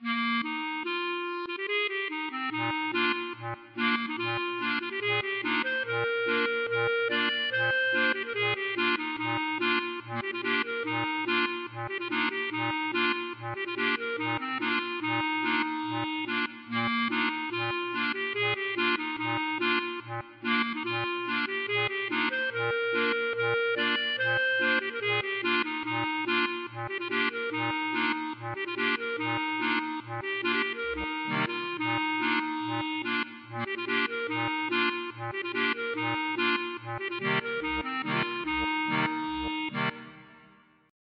klezmer

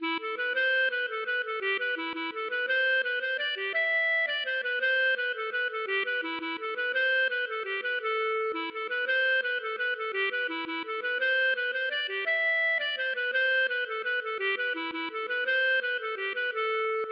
mazurka

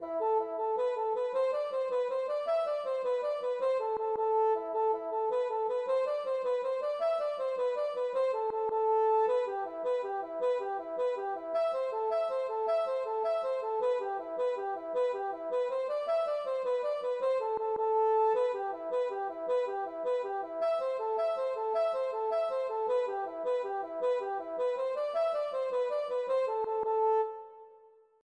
jig

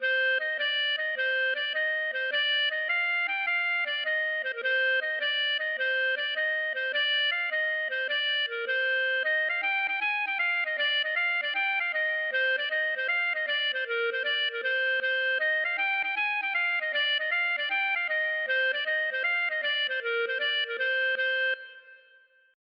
gavotte; Tamm Kreiz

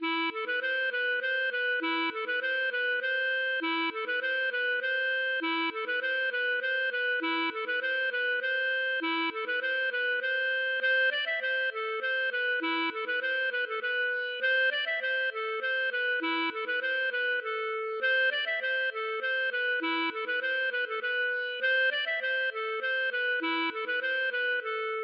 hanterdro; Dix Marins Sur Mer

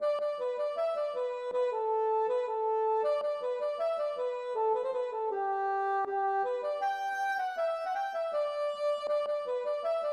polka

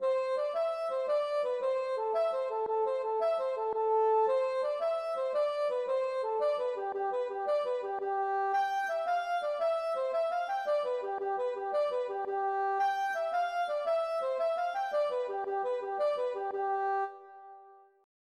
jig

